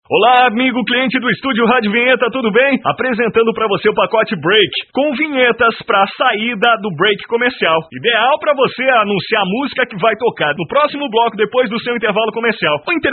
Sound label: break